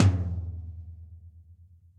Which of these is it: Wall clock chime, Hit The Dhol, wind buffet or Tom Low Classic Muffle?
Tom Low Classic Muffle